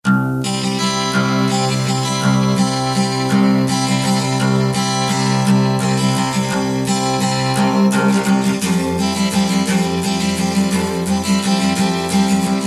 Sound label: Acoustic Guitar